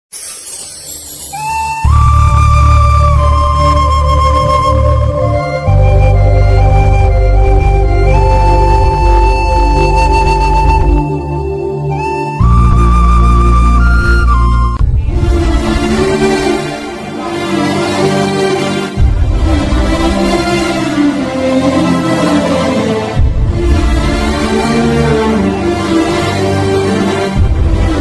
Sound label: love feeling bgm ringtone, flute bgm ringtone; arya 2